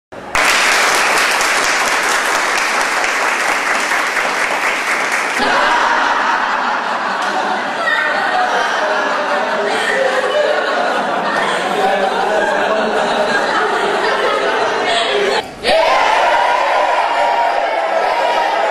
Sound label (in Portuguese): plateia feliz efeito sonoro palmas aplausos gritando gargalhando